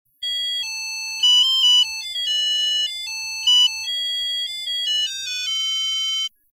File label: panasonic